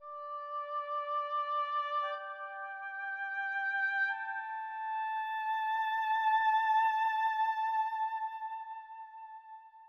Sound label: keys, Sad Loops